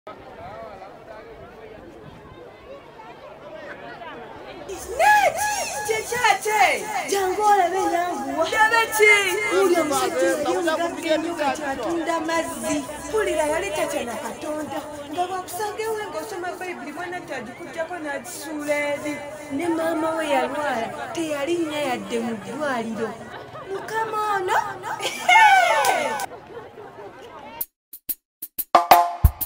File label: choir